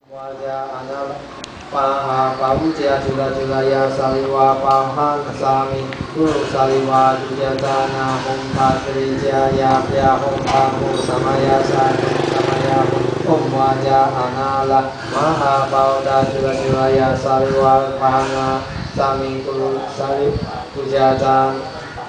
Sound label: penang water festival eve